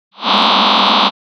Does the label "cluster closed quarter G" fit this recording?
Yes